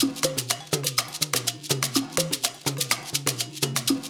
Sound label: percussion loop